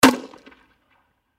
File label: Grenade Launcher 40mm M79 Single Shot; Distant Perspective; Warfare; Blastwave